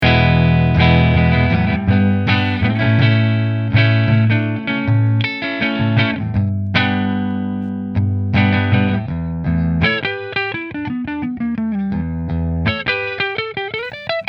McNaught Grey Neck Fender

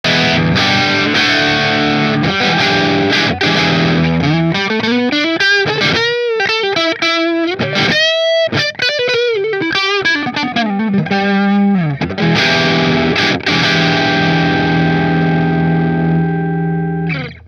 Robin Ranger position 4 marshall, guitars

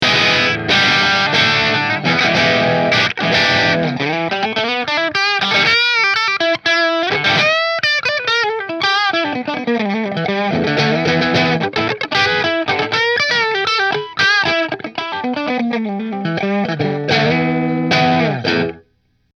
Amber Burst Position 3 Marshall

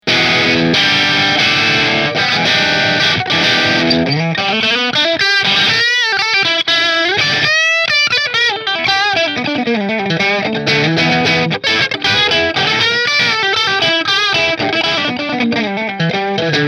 Rahan Red Flame Maple Bridge Marshall, guitars

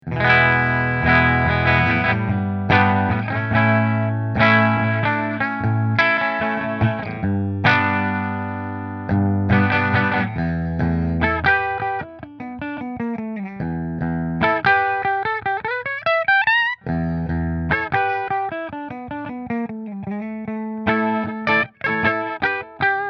Prestige Bridge Fender, guitars; Prestige Guitars Heritage Hollow SB QM

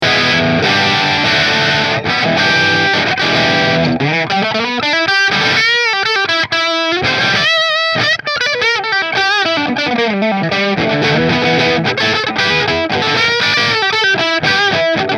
Prestige Bridge Marshall, guitars; Prestige Guitars Heritage Hollow SB QM

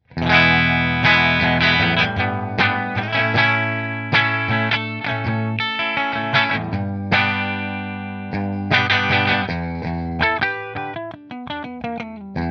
Orville Bridge Fender, guitars

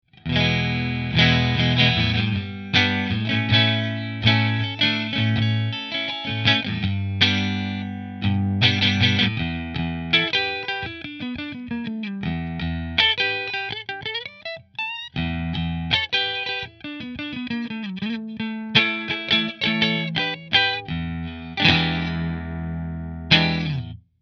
Italia Position 3 Fender

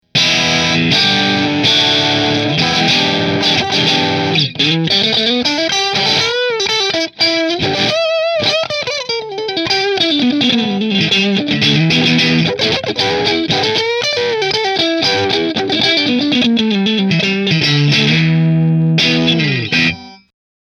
JB14 Driftwood Middle Middle Marshall; Nola

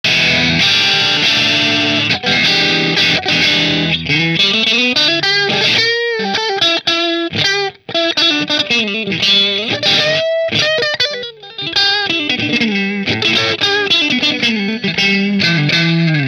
Robin tonemonger position 4 marshall, guitars

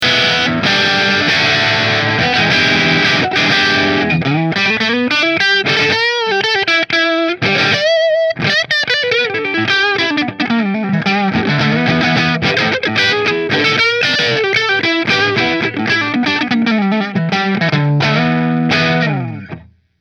Tabacco Neck Marshall

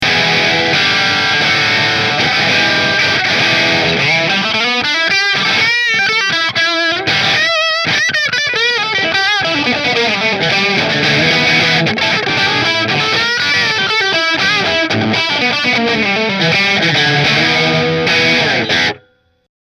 Rahan Ambrosia Position 3 Marshall, guitar; Rahan Guitars RP Single Cutaway Ambrosia

